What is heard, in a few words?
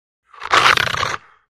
Dog, Rottweiler Growl, Snarl. Single Low Snarl / Growl. Close Perspective.